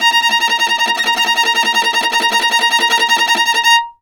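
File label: viola tremolo A#3; Orchestral; DirectWave; Varazdin Orchestral; Viola II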